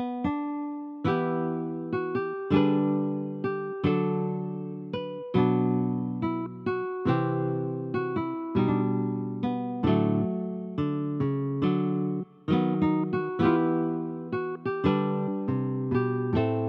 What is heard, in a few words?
Playing the Lute; betrayal at krondor